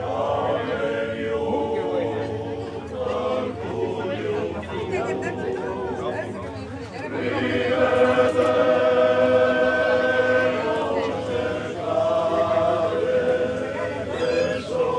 a choir on the boat from montevideo to buenos aires